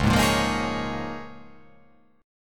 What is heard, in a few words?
C sharp 6th Add 9th C sharp down